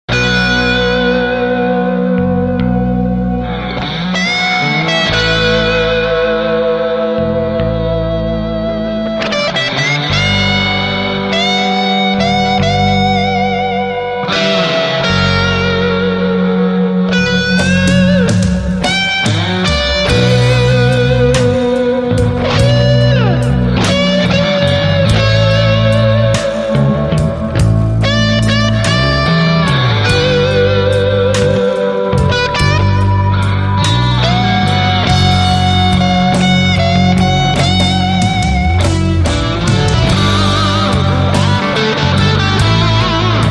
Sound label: Ballad Wha